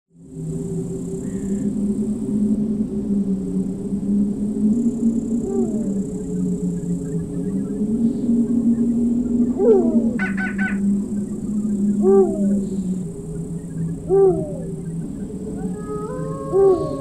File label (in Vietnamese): Tieng xung quanh mot khu rung toi tam dang so